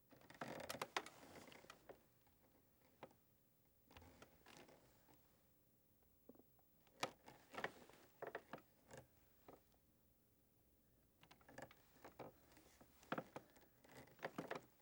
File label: Wood Chair Creaks; Fairlight Sound Lib